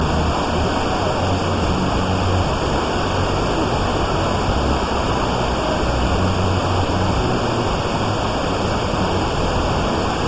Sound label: wfm mode; linuxdsp